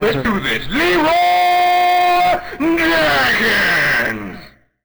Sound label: gear, Guitar